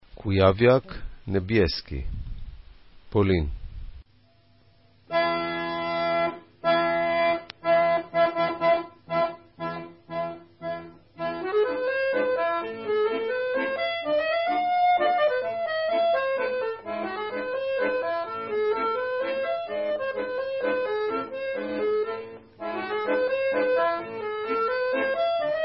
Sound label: Kujawiak Polish